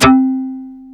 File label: DS8 C 3, Korg